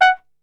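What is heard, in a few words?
F#3, flugelhorn, brass; Best Service; Brass Super Section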